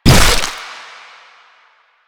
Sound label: Infested Ancient Footstep; Enemies; Proto Infested Ancient; Warframe; Ancient Ninety Nine